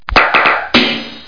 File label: clap